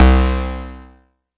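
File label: mbrute synth, Arturia MiniBrute; DRUM MACHINES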